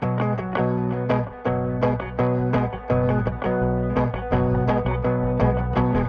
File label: music